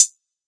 Low High hat; drums; hip hop; STYLE KITS (Hip Hop, Etc); Khezie Hip Hop Kit

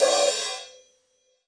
crash deep long choke

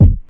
classic hip hop kick; Classic Hip Hop Mega Kit

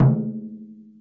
miyadaiko A1; ETHNIC and WORLD PERCUSSION

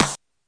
clap sd, snare; single hits

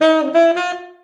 bluesy sax finish; HORNS SAX TRUMPET BRASS

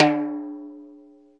TIMBALES

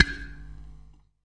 Clay bowl slap, ethnic and world percussion

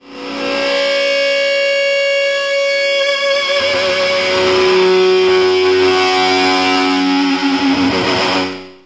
feedback eat my carpet ride, Guitars

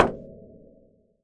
Fracture Perc; ModeAudio Found Percussion Hits

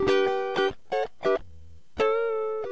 geez i am funky, guitars

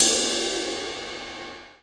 Cy Brush kit; STYLE KITS (Hip Hop, Etc)

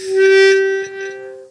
harmonica note

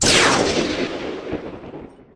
noisy zap burst; Electro and Synthetic

